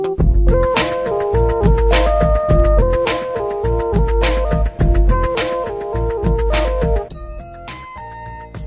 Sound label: bgm